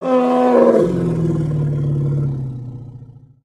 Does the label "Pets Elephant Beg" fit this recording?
Yes